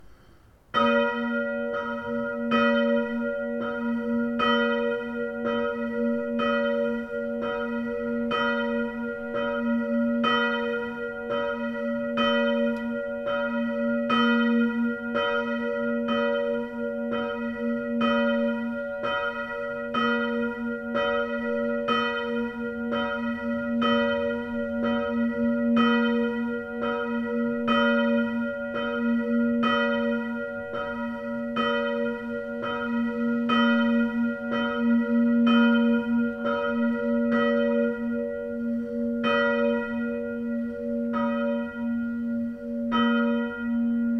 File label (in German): Glocken St. Mariae Geburt b